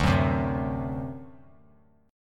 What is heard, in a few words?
D 7th, down; D7 strummed; JGuitar